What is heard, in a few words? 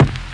lofi crunch kick; Kicks; single hits